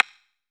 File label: BACHI, TAIKO; AKAI S6000 CD-ROM - Volume 5